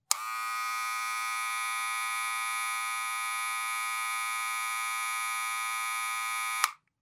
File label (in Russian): zvuk mashinki dlja strizhki